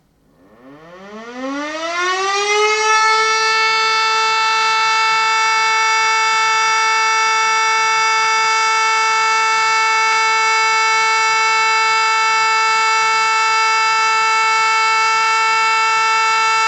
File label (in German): entwarnung